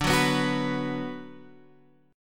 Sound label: B 5th D; B5/D strummed; chord shape; JGuitar